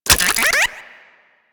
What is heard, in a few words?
Lure Hold Position; Warframe; Teralyst; Vomvalyst Lure